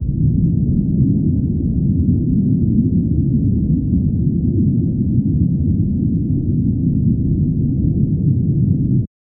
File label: deep underwater ambient